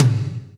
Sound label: TOM TOM, Roland